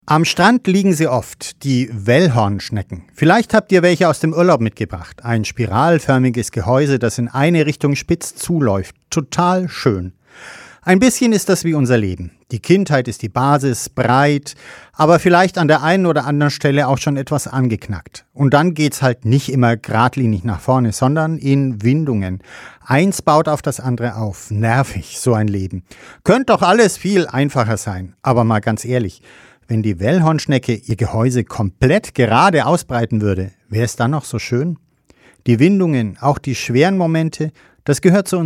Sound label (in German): gong